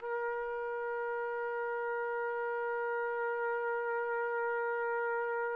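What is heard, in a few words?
TPT, trumpet; Roland - LA Composer vol. 3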